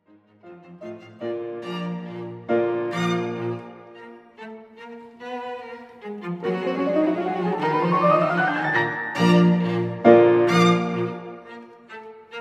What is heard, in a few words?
Piano Trio No. 2 in E flat major; Franz Schubert; D 929 (Op. 100)